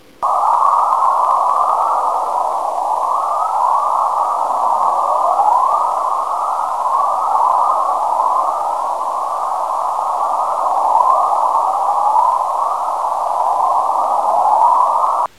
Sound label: chorus